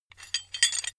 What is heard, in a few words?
metal handling pieces